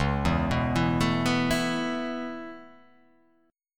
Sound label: C sharp Minor 7th Sharp 5th C sharp